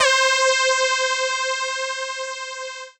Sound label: Dance Floor Bass, Multisample Basses